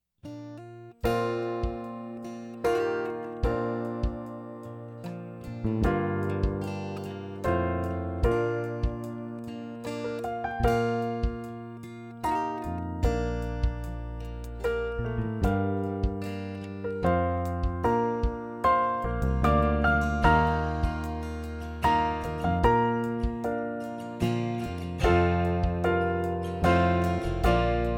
full band

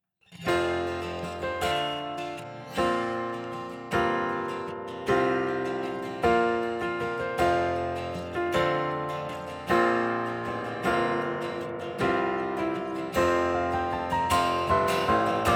with guitar; Praise to the Lord

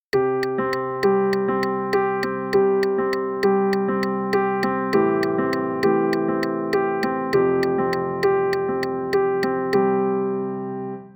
Expanded push

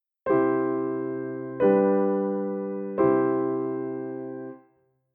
C G C shape

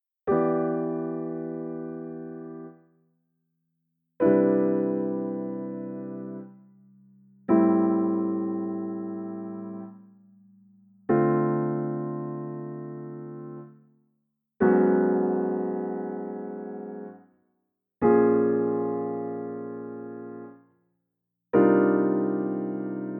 7th chord examples

